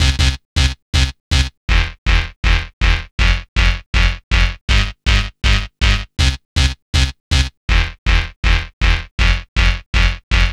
Dance Synths, Hardcore Loops, Synth Loops

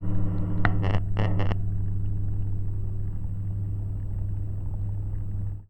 Fan, contact mic, Mechanical; musicradar; contact mic madness; Raw

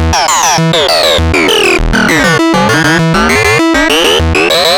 Atonaloop, Industrial Analog

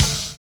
DOUBLE BD; Univers Sons

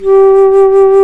bass flute, vib; Roland L-CDX-03 Disk 1

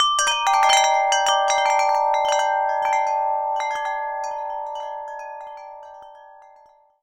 WIND CHI; AKAI S6000 CD-ROM; WorldSounds; STRUCK CHMES

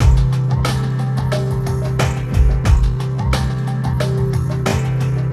Ambi Groove; Sonic Foundry (Sony Creative Software); Ambient Grooves; Ambi-Grooves

